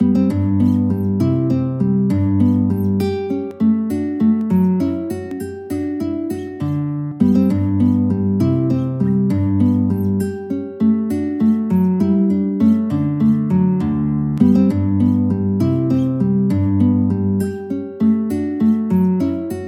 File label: Gigue G Major guitar